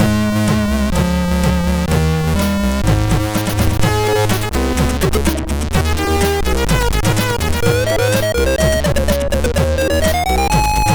More Groove, sid MOS6581R3; hvsc

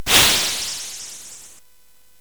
SFX; Narco Police; hvsc

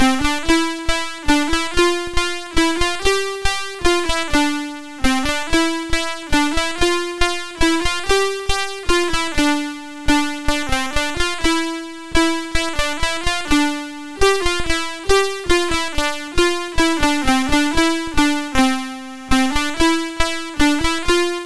Melodie BASIC, CSG8580R5

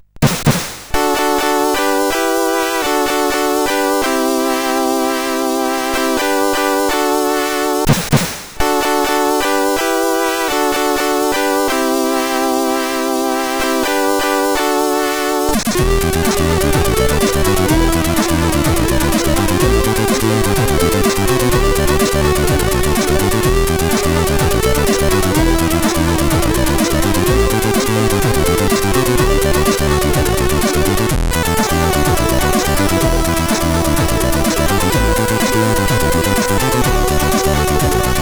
remix sid CSG8580R5; Starquest

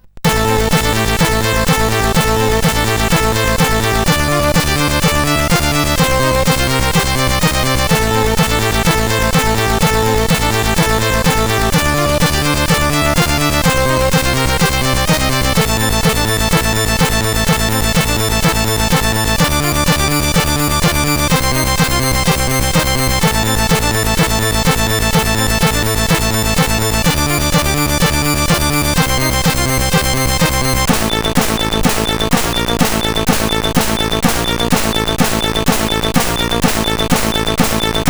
Techno sid CSG8580R5

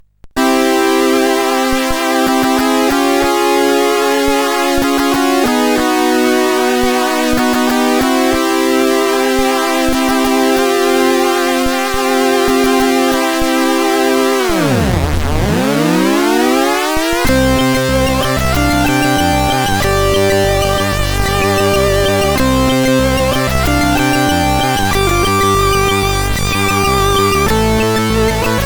Another Sound, CSG8580R5

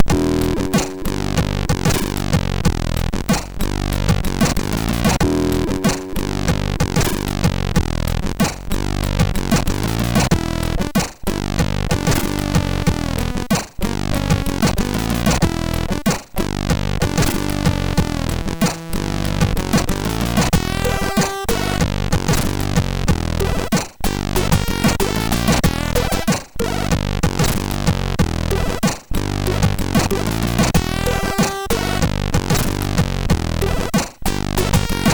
Blues, MOS 6581; MOS6581R3; hvsc

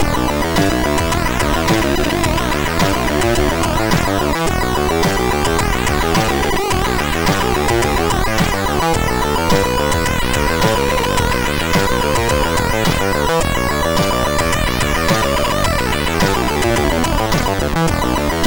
Test Long Sequence, sid MOS6581R3; Driver 14

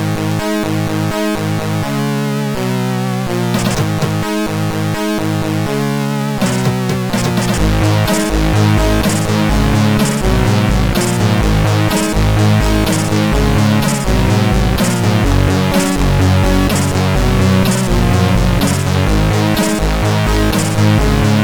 Heavy Intro Tune, CSG8580R5; sid

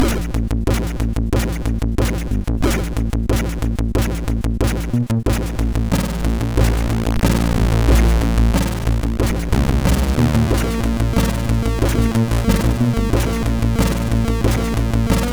remix, MOS6581R4; hvsc

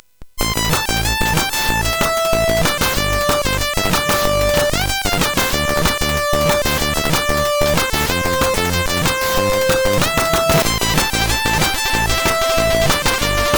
Fast Melody, sid CSG8580R5; hvsc

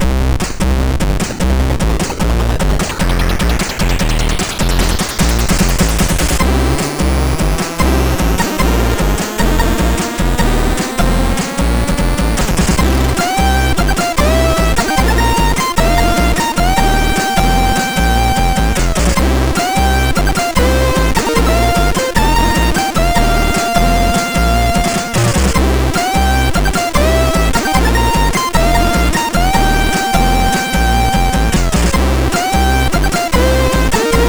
c64 MOS6581R2; scene

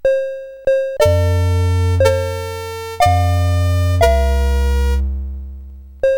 Birthday, CSG8580R5; sid

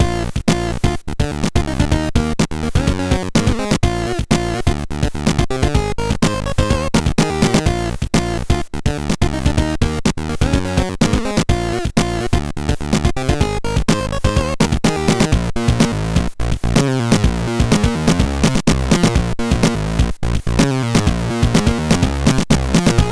Funkey, MOS6581R3

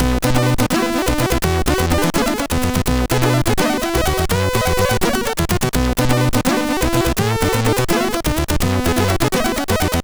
jazz, MOS6581R4; Shoort Jazz

